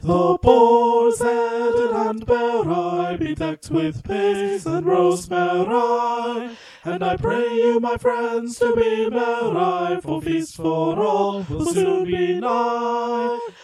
The Boars Head Carol together

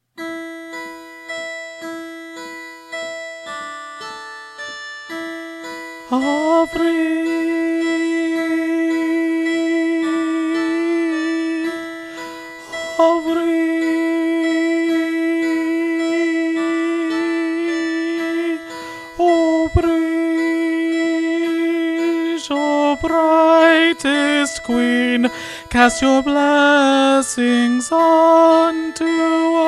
hymn to brighid alto

